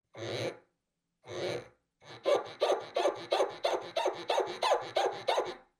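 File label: clean dishes sound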